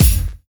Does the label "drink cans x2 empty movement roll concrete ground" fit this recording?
No